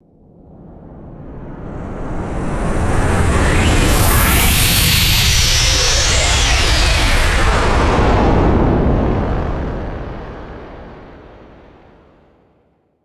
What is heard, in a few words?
slow synthesized power whoosh with long tail; swooshes